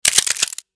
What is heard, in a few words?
mechanism reload clicks; soundmorph